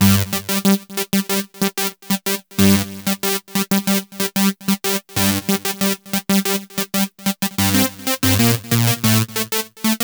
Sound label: E-Coast HipHop Full Synth Gmin; Digidesign; Pro Tools Essential Loops